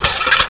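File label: rocket pickup, ammo; weapons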